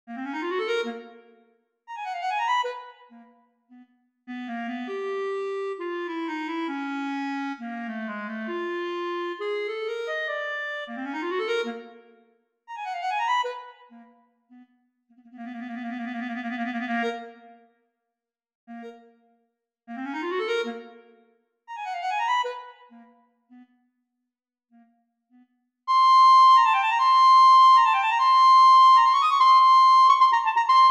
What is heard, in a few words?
Eb Clarinet